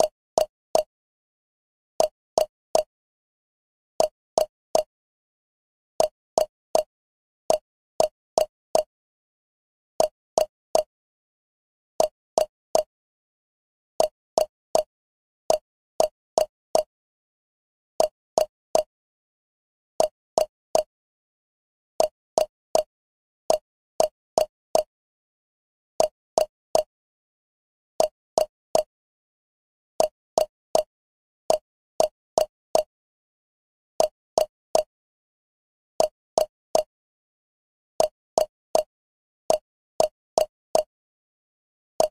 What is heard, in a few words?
Funky Puzzle Zone - Metronome; Underhero